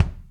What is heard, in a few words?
Kick Plastic Beater; Hybrid Kit; Drum Hits; Drumdrops Recording Hybrid Kit